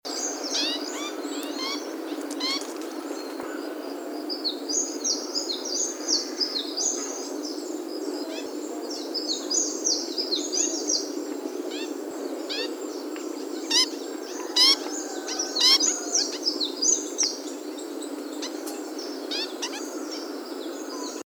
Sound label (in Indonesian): suara panggilan bondol rawa